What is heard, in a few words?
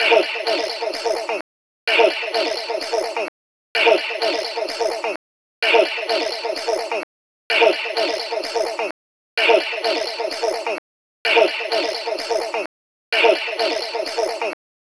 fx fill, Key D#, Bpm 128, Big Room House; Loop Nerds; Big Room House Allstarz